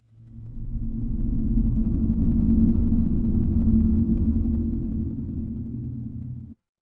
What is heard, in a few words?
horror drone